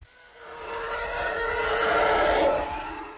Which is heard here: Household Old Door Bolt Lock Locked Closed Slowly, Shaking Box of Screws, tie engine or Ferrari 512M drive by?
tie engine